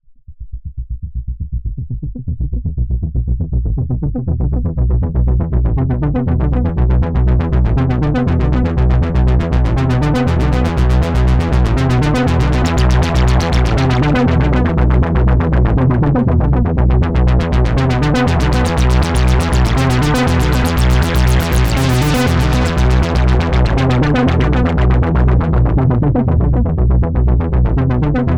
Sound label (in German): Erica Synths Syntrx II Analogsynthesizer Einfaches Basslead zwei Oszillatoren mit Filter